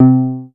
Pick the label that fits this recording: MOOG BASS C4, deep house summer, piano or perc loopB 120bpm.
MOOG BASS C4